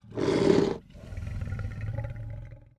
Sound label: sphinx cat purr